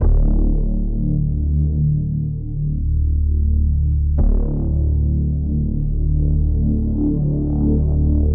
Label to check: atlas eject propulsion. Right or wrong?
Wrong